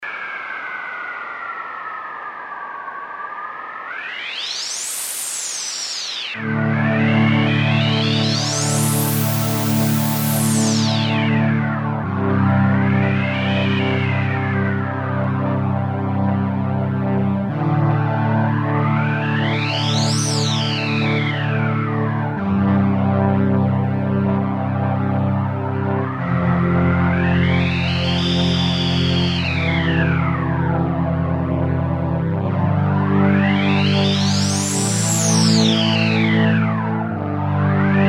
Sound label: pad desert, Kawai sx240